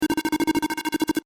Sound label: pipipi